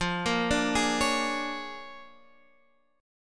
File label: A sharp 7th Sharp 9th